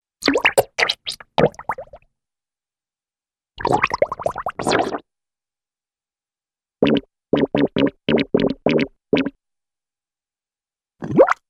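Water Drip with light Slurp-like Sounds & Small Bubbles, Cartoon; Hollywood Edge; Cartoon Trax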